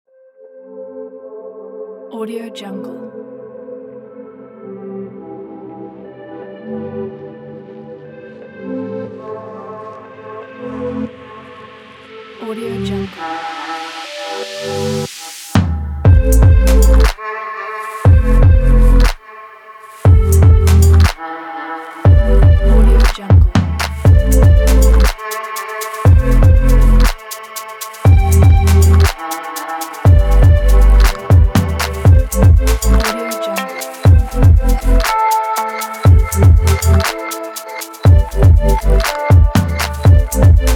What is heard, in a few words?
ambient abstract